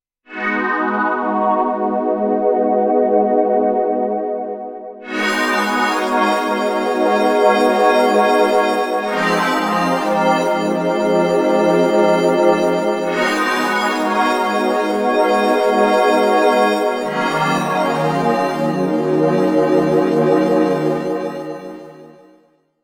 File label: Fat Pad; KORG Finite